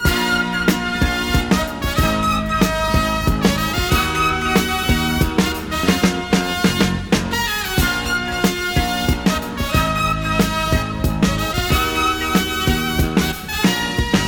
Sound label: full horns in three six, Loops, Phonky